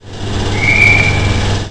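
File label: Door Close, Train; M41 Halbi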